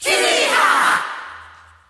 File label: cheeeyah crowd; ooh ahh